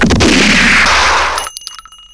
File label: winch; gunslinger